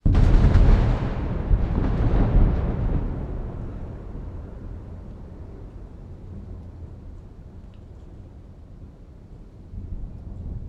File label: Lightning Impact